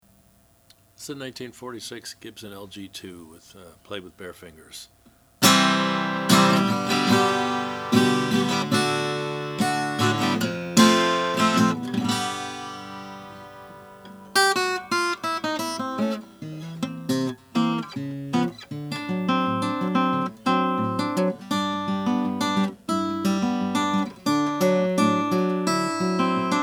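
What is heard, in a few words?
1946 gibson lg2 sunburst, guitar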